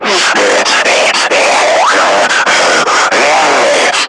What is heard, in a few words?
Screamer Idle; Warframe; Lasrian